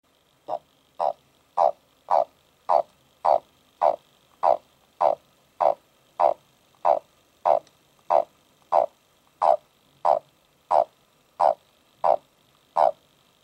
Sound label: Bufo eichwaldi